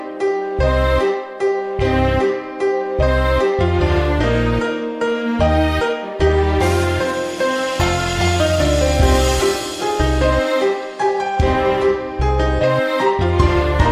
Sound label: romantic soft